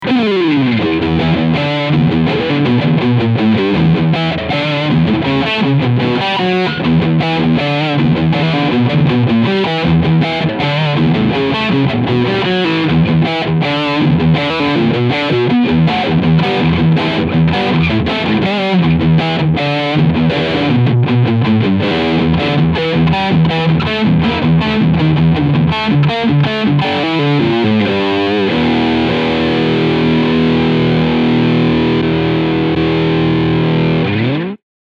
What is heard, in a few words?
Fender AM Pro II Strat HSS Riffsound Humbucker